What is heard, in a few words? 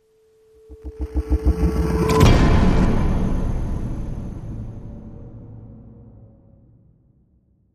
Dark Rise Hit